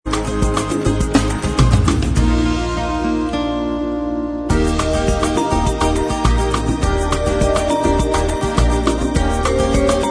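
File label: bossa version instrumental track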